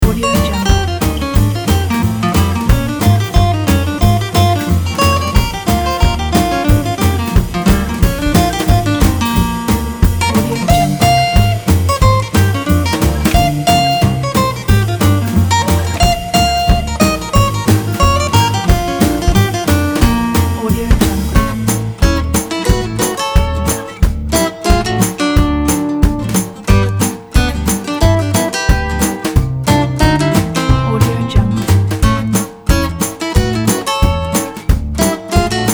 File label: jazz guitar